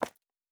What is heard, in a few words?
Footstep Concrete Running